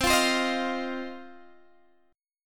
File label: C Minor Double Flat 5th C down Guitar DADGAD